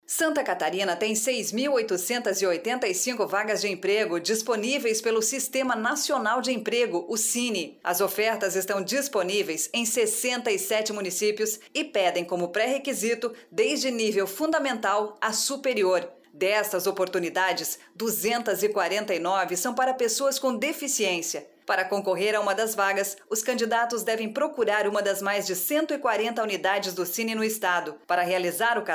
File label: Sine